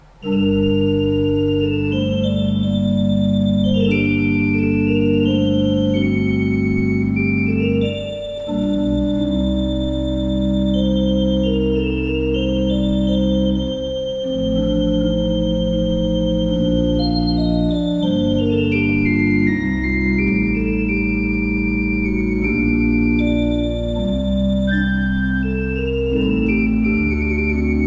Ott, pipeorgans

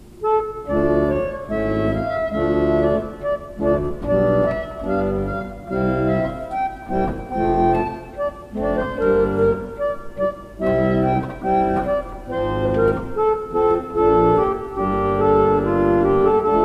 pipe organ; Rushworth und Dreaper

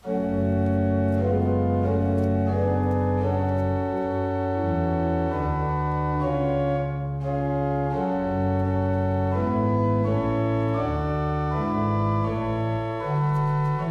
Wegscheider, pipeorgans

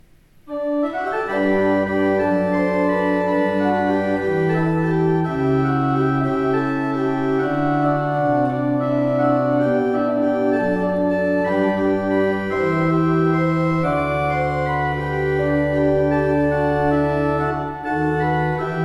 pipeorgans

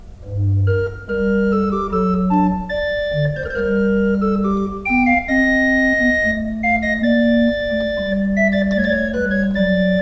Grenzing, pipe organ

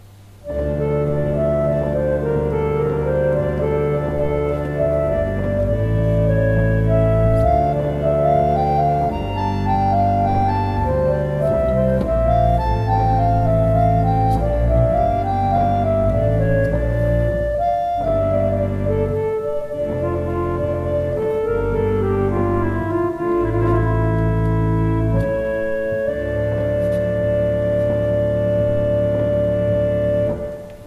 Mannborg, pipeorgans

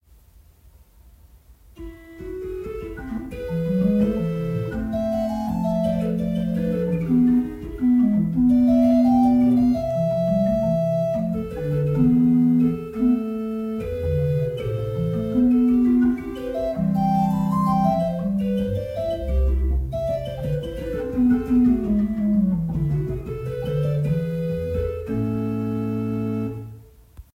Mayer, pipe organ

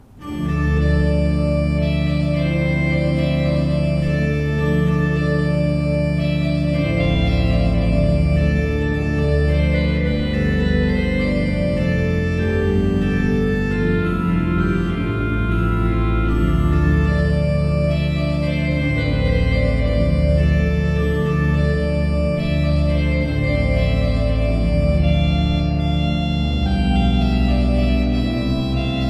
pipe organ; Seifert Koeln 32 III P

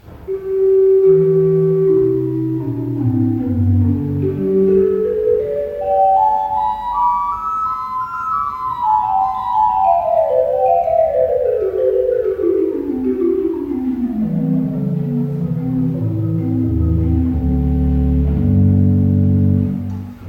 Becker, pipe organ

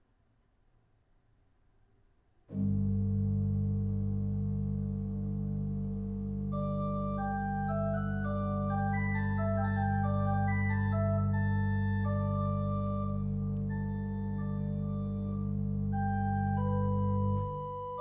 pipe organ; Kleuker; Kommet ihr Hirten; Year of construction 1970; number of manuals 2

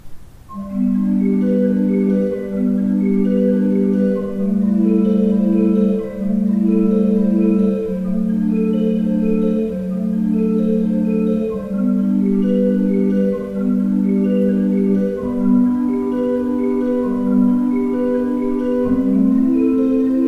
Graf, pipe organ

